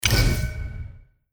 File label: UI Pop Up